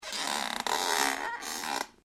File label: METAL CREAK; Materials